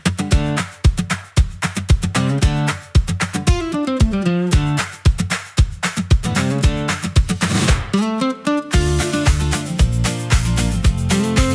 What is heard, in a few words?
Bright Morning Dash, whistles